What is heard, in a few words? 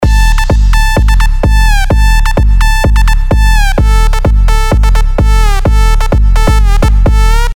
Sylenth1 dirty dutch leads; Clubbin'; revealed sylenth1 dirty dutch leads vol 1